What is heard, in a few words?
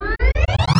exit performance mode; tf2; garrysmod